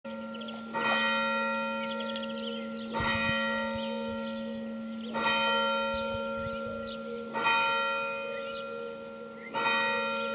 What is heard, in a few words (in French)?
MIDI; CHERVES 86